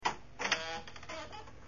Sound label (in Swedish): Dorr oppnas